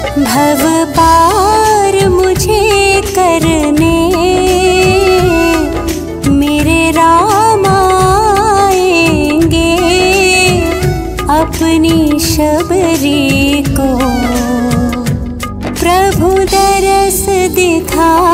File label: Shri Ram Bhajan